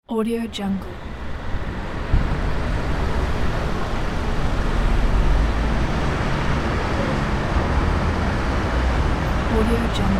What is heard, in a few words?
city life sound; okanakdeniz